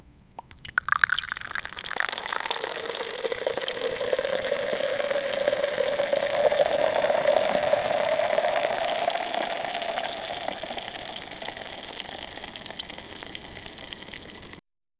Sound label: chocolate saus pouring in, food